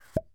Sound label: Spray packaging Pop Sound